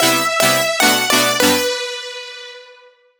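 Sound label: synth loop turnaround 150 E minor; Virtual Riot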